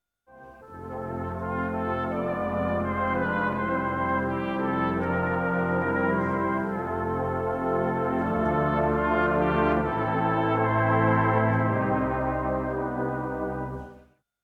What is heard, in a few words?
brass